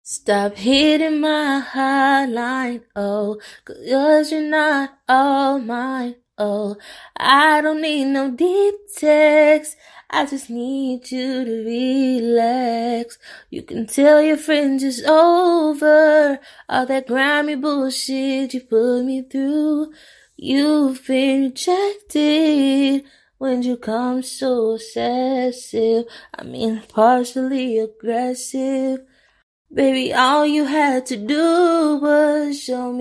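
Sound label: pop 110 B flat minor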